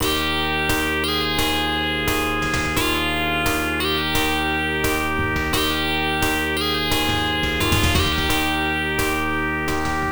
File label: A Victorious Bell is Ringing; Sharp X1; herzog